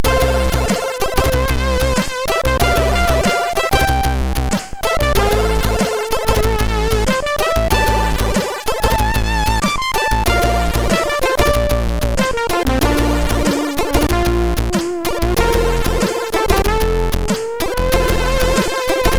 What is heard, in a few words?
Drum em, MOS6581R4; hvsc